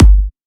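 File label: Kick, G minor; Sample Banks; Percussion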